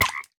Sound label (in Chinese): 青蛙受伤声